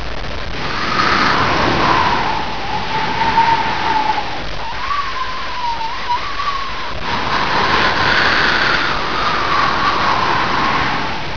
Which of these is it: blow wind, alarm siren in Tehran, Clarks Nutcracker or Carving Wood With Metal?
blow wind